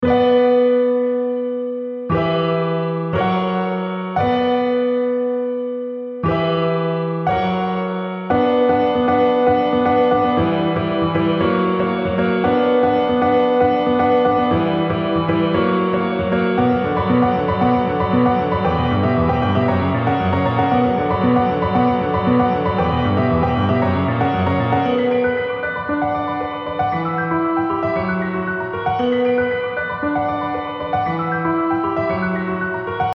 amp reverb

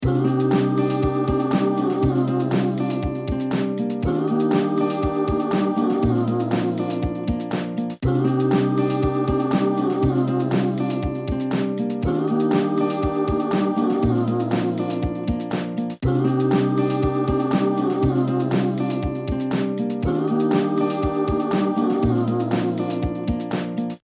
U73 NO COMP

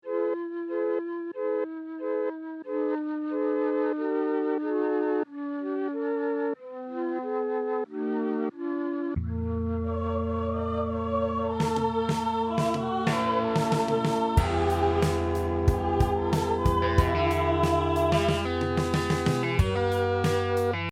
melotron micro flute

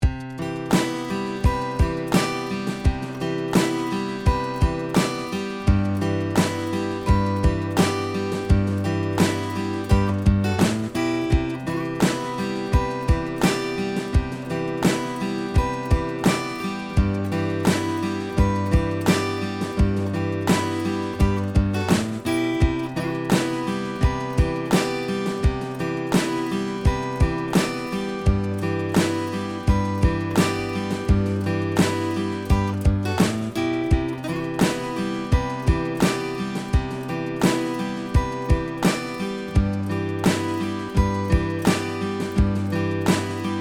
UA VOLT VINTAGE COMP GTR

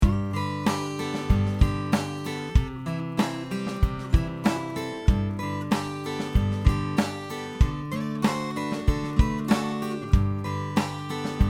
CHANDLER Limited TG 2 500

